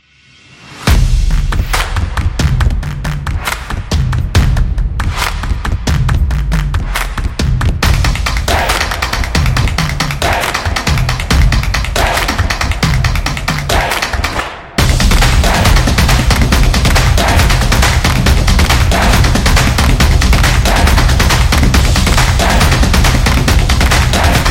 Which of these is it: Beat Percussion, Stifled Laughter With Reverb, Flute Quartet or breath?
Beat Percussion